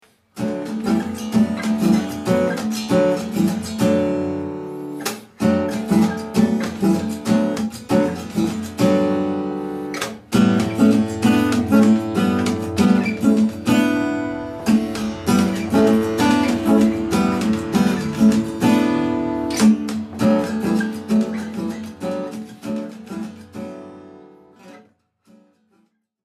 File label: Guitar